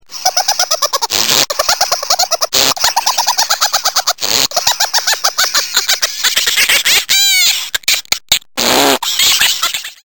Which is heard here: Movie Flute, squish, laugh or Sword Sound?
laugh